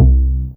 V Pizz C2, Fairlight CMI IIe Pizz Str

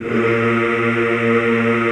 Classical Choir; Best Service; Extended Classical Choir

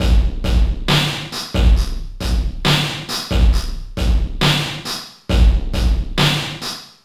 FX kit, beats

